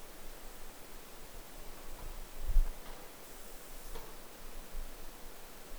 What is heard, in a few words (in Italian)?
rumori di spostamento tocco